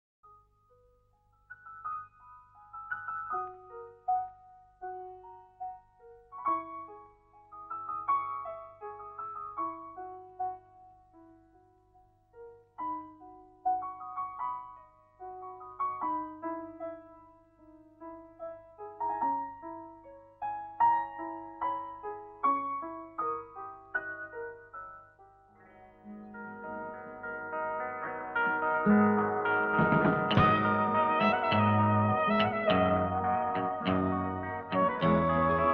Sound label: melody